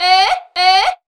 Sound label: Grito Eh Eh!; Roland BK-5